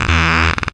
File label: bass e, Talking Bass